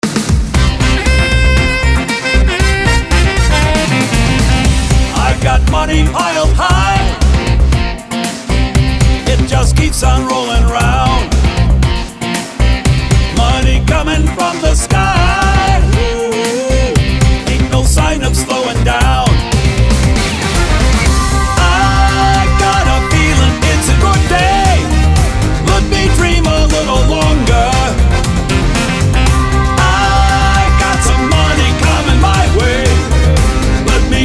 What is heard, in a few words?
money roll free spin tune